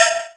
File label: Jungle Cowbell, drum machine